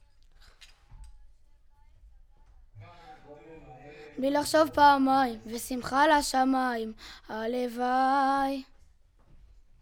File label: VOC